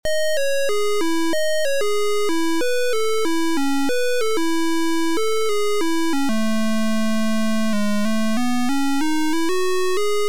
MELODY

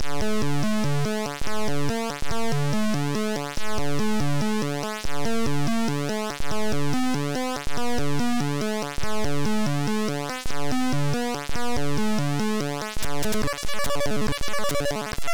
Title Beeper; Full Throttle 2

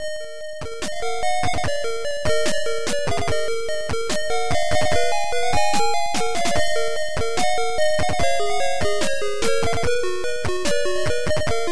PLINK; Dr Dismal

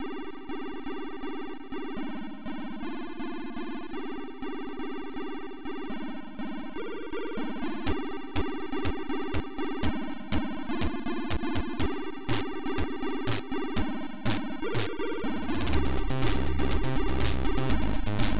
drummed

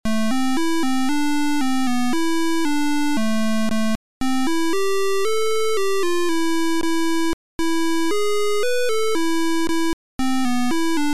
MELODY

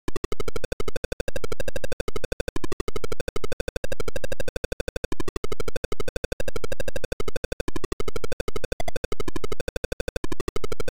Title Beeper; Manic Miner

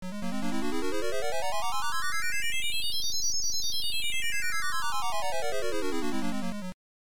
Title Beeper; Stifflip & Co

